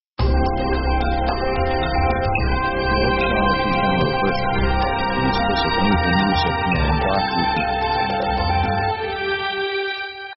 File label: orc progressing theme; orchestra themes